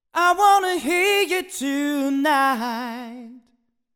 Vocal

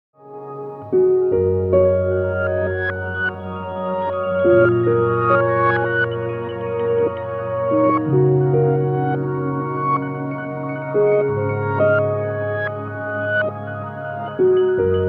Microcosm Ambient Soundscape piano; Hologram Electronics

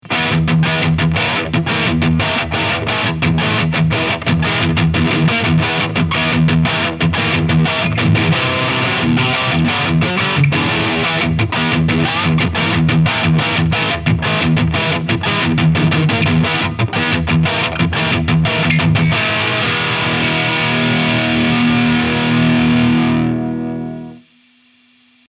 Harley Benton R 446 Lead; Blue Metallic

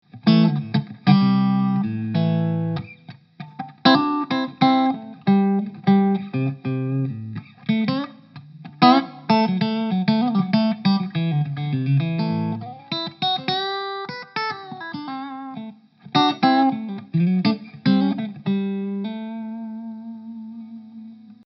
VOX MV50 Boutique clean stratstellung; gain 10.00

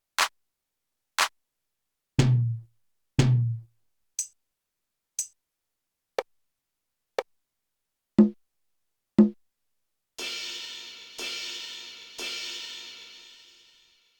Percussion Sounds

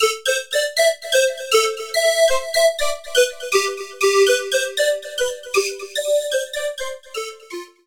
D-50 Calliope

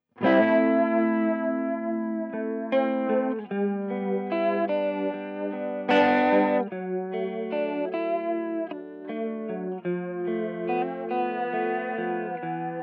Beetronics Seabee; Factory Preset

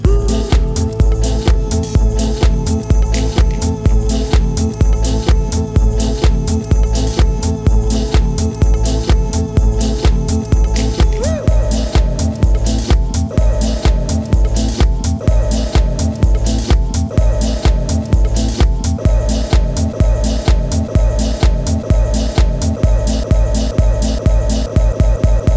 Roll

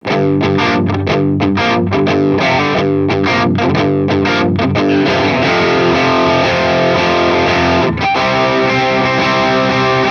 Hi Gain; Boss IR-2

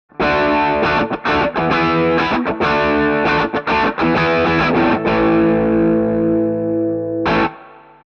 GA 47 Cardioid Crunch Chords

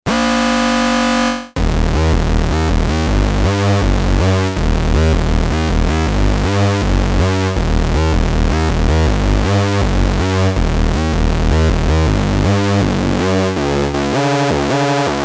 Moog Mother 32 DnB Bass

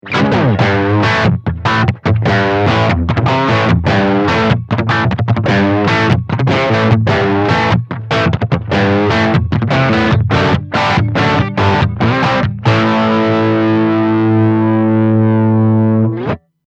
British

